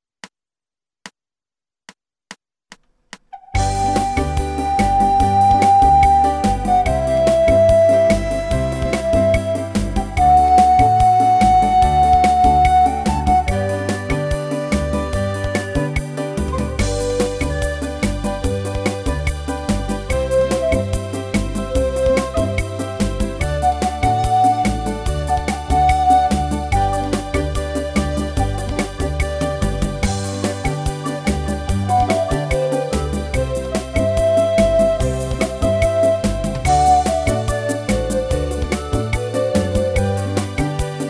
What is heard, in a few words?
G C D G